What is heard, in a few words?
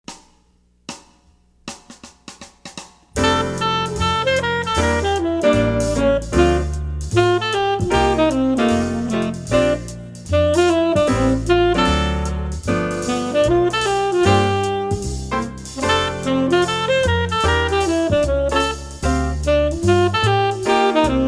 Swingin'; 15 Easy Jazz Etudes; CM Level 3 Solos (jazz)